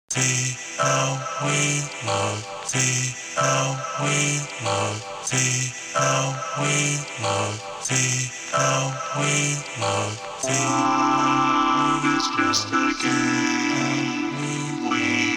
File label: fall; weeklybeats 2024